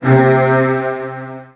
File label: C2, Virtuoso Strings, 16TH; ILIO; Kirk Hunter; CL 16TH